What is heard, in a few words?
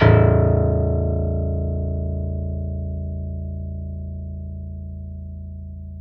PIANO A0, STEINWAY; Greytsounds - Philip Wolfe David Crozier's Saxophones; GR8 STEINWAY